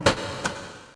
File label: click